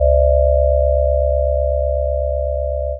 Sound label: chord quadratic products